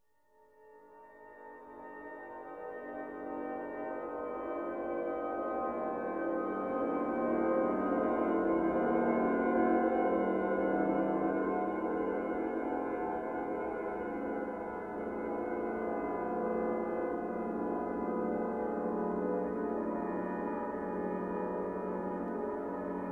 Flying High was written for two-channel electronics using analog oscillators and modifying equipment